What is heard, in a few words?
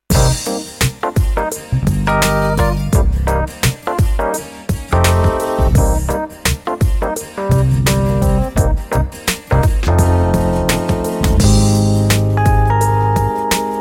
Smooth Jazz EP; Combi; Smooth Jazzmitazz EP1